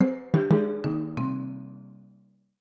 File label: Jingle Lose